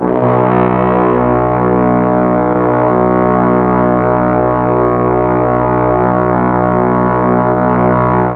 B1 BRASS, mellotron; HALFSP.BRASS

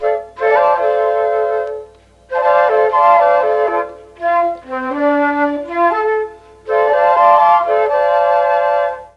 C4 CHA FLT, mellotron

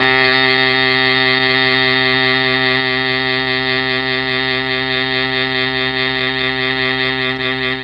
C2 MKII SAX, mellotron

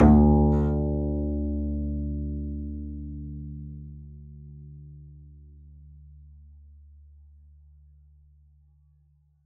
pizz d2, double bass

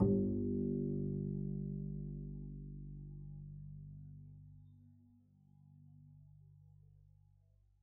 pizz g1, double bass

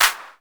clap, drum machines, Roland CR-8000

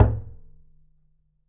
perc, pizz; meatbass

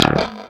db2 staccato, glowly bass

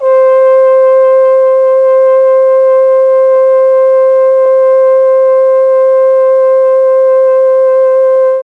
C4 TROMBONE, mellotron; CHMB TRMBONE

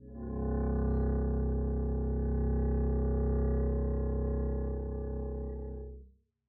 arco c1 p down, double bass

